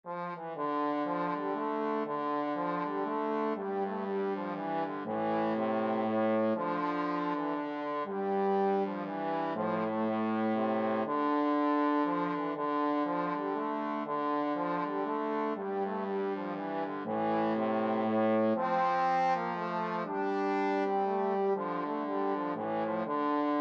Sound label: trombone duet